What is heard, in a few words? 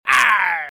monster unclick